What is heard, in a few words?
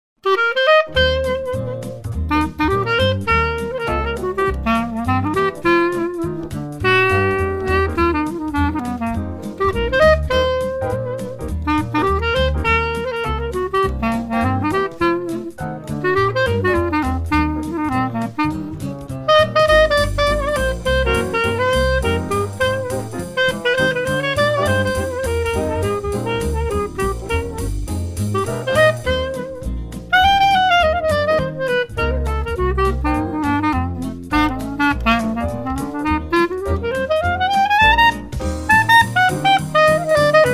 clar